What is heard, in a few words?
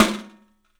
STEEL S, drums; 3D Sound